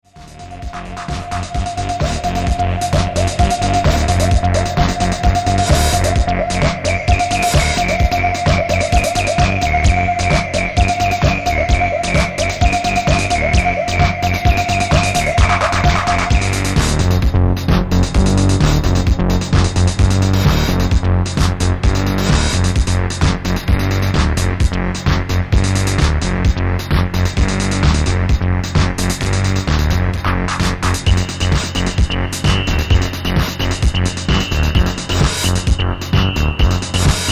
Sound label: Bass